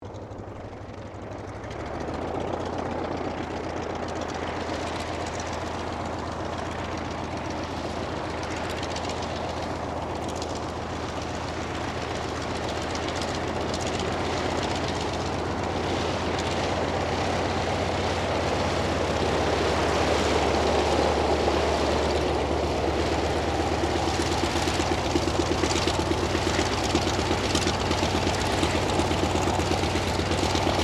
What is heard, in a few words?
AIRCRAFT CAC WIRRAWAY IDLE; CA-16 Wirraway; Airplanes; Vehicles